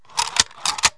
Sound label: imprint, office money; KABOOM! - Wild and Wacky Sound Software